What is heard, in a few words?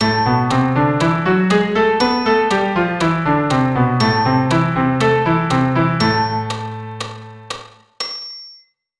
Am melodic